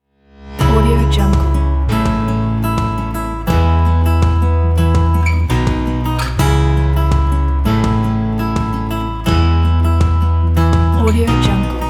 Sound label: acoustic romantic